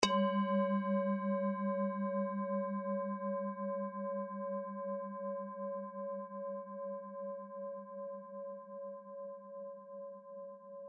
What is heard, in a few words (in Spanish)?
cuenco